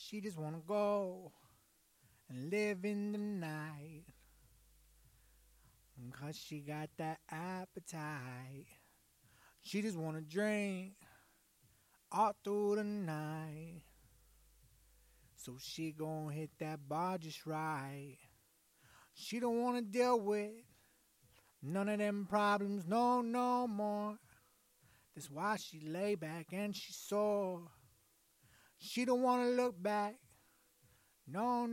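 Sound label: hip hop 50 A minor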